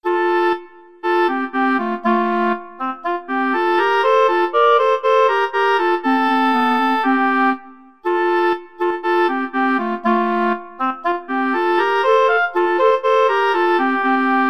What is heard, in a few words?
oboe clarinet, clarinet-oboe duet